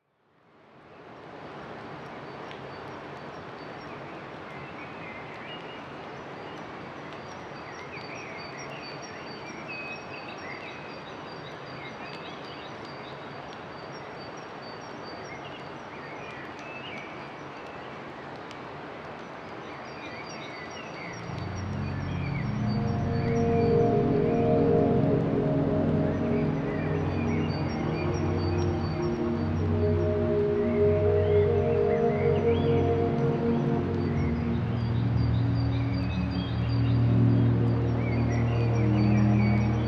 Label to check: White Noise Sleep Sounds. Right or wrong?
Right